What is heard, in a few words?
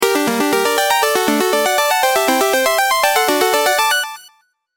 level up fanfare; Stingers; freepixel